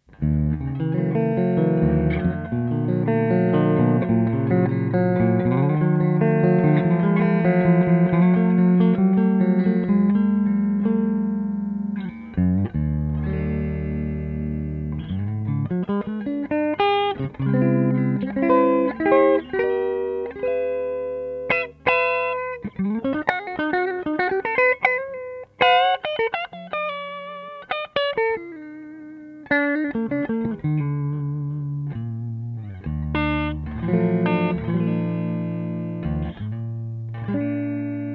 Tele Gain; 1h Treb 2h Mid 11 Bass 11

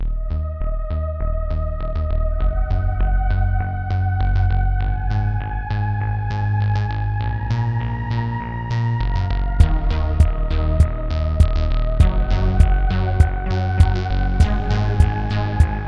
nitron

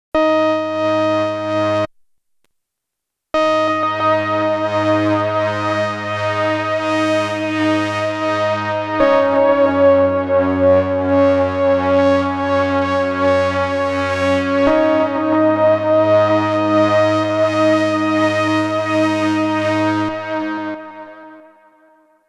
Synth Drum Echo; Source Audio Nemesis

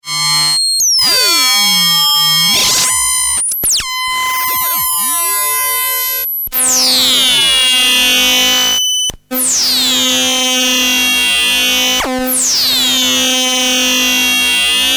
Solus VCF

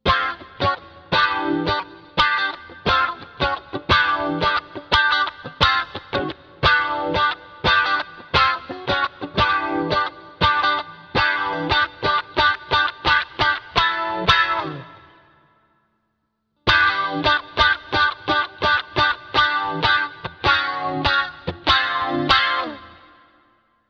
Comp off Clean Funky Switch Bright; Auto Quack

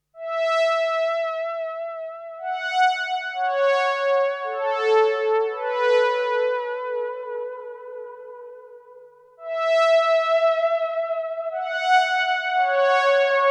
Strymon Time Line Tape Echo Synth

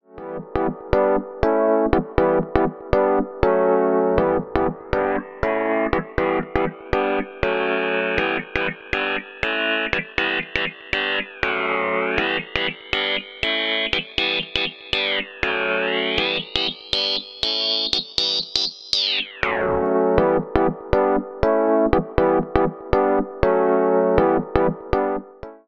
AKAI MPC LIVE II Ring Mod

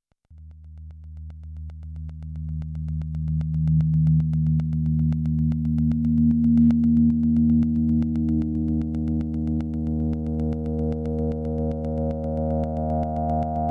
spectrum dry LPF sweep

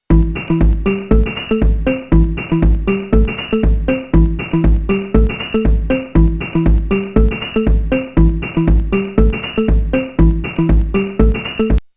Pocket Operator PO-133; Teenage Engineering

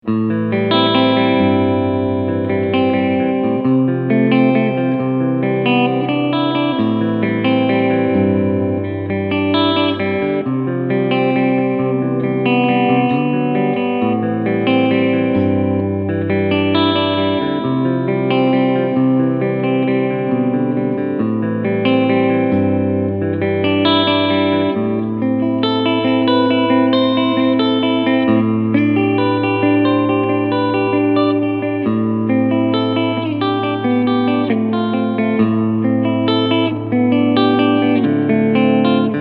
Supro Delta King 12 Combo Clean Bass full; MidHigh 12

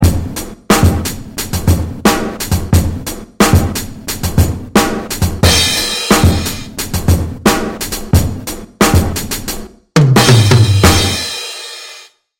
Worst Drummer Analog Drive Reverb

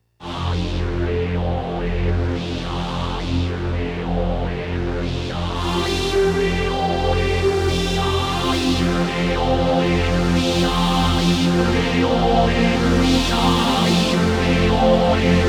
MODX Vowel Pad AWM

